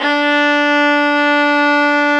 STR VIOLA, Str Quartet, Strings

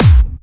Clubby Kicks, Bassdrums

